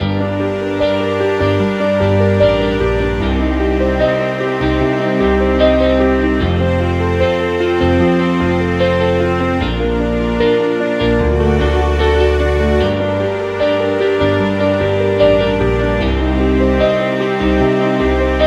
dramatic guitar; by burak sound bank; dramatic dram vol 15

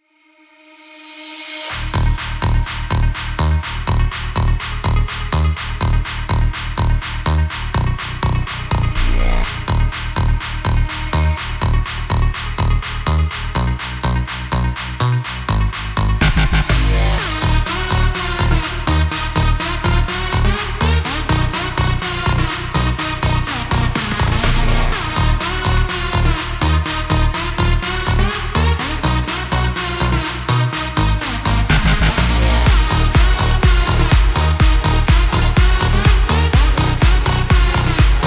house electronic techno; By Burak Sound Bank

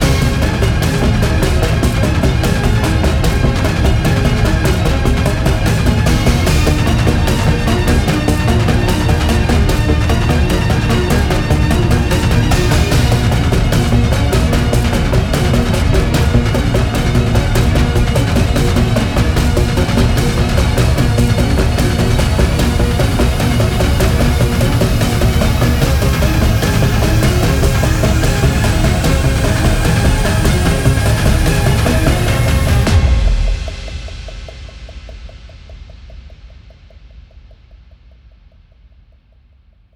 tension violin; By Burak Sound Bank

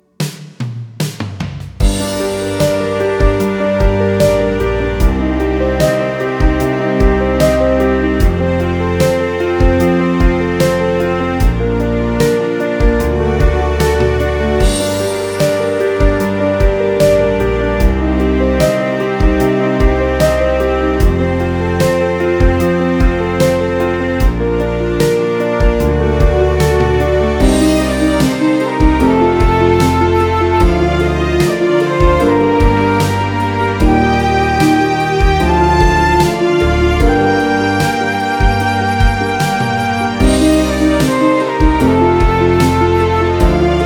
dramatic drum; by burak sound bank; dramatic dram vol 15

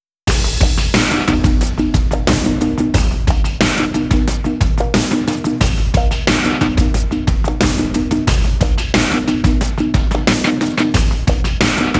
cheerful drum comp bass; by burak sound bank; cheerful vol 19

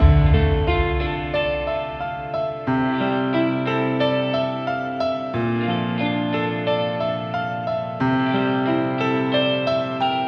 dramatic guitar no violin; by burak sound bank; dramatic dram vol 18

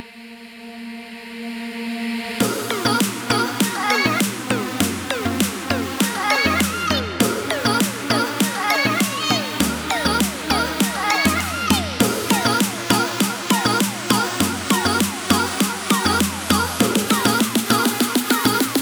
reggaeton; By Burak Sound Bank; reggaeton tema peketleri vol 2

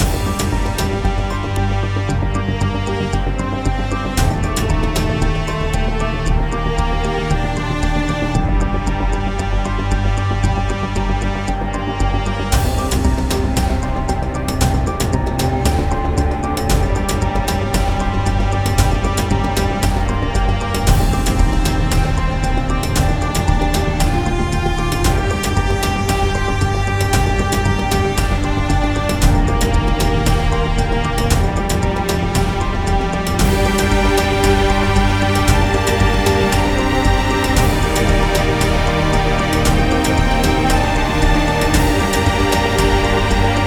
cinematic; by burak sound bank; tension gerilim vol 50

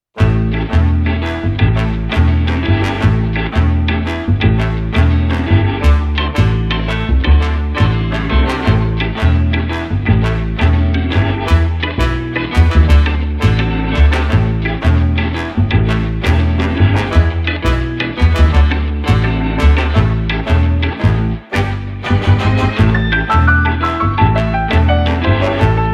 cheerful entertainment soft light vers; by burak sound bank; cheerful vol 8

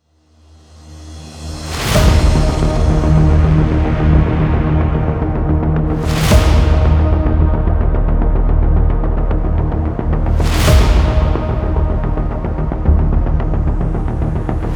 tension; By Burak Sound Bank; tension gerilim vol 21

